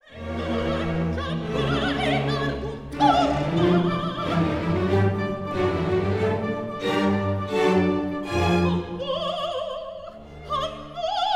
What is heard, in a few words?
Orch Vocal